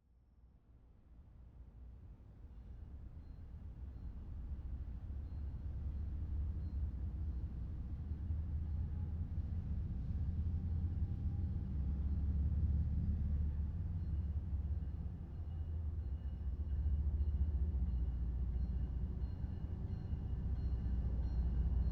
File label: Train,Freight,Diesel-Electric,GE,AC4400CW,Locomotive,3 Cars,By,Slow,Bell; Freight Trains; Airborne Sound; Vehicles Freight Trains 1